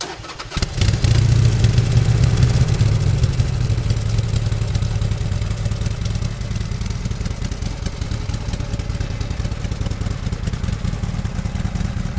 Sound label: harley davidson start engine; salamisound